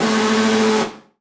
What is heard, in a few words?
Multimedia Internet CD-Rom Flash Animation